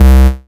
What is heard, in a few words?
Bass C, Offbeat Bass